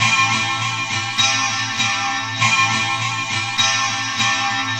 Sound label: Simple Guitar, Strings; Sonic Foundry (Sony Creative Software); Myths of Technology